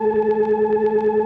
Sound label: ORCHESTRON G A2; Sonic Reality; Vintage Timetraveler